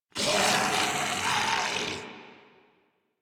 Laugh Ghoul Sarge, Barks Battle; Grineer Ghoul Drill Sargeant

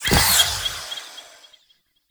Wraith Throw Loop End; Enemies; Warframe; Zariman

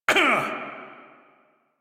Pain Male; en; Warframe; Railjack Crew; Arbiters Hexis

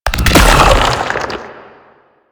Octopede Horrors Charge; Warframe; Man In The Wall

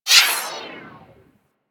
Skate Stride; Enemies; Skate Movement; Corpus Modular; Warframe; Corpus Modular Crewman

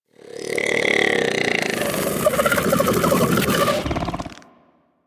Bug Vocal Snore; characters; Warframe; Hunting Critters; Inf K Drive Bug

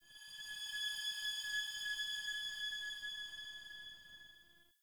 Hub Texture E, Ambience, Dungeons, Under City; Silent Rose

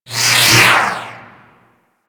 Beam Gun Loop One Off 3D A, weapons; Player Path Beam; Fomorian

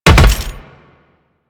Land Heavy Metal, Footsteps; Warframe; Teshin

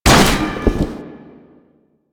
Umbrella Stand Destroy; Warframe; Nineteen Ninety Nine

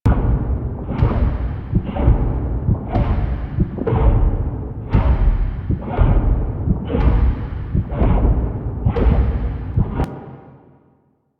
Vault Heart Beat Loop; Ambience; Warframe; Infested Eris

